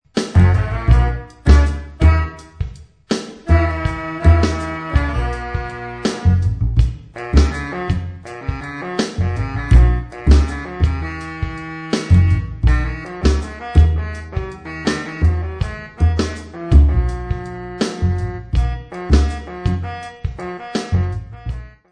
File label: Semi Funk